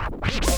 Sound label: Vinyl FX and Scratches, FX Scratch